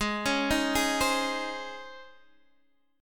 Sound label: C sharp Major 7th Suspended 2nd G sharp